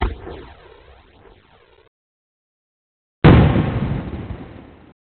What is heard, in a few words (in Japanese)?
hanabi normal